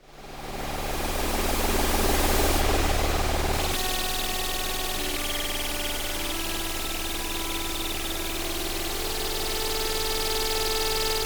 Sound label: G drone